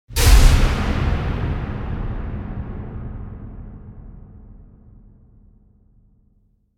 Impact Metallic Big Shocking Strike - Strong Reverb; Hollywood Edge; sound designer's toolkit v2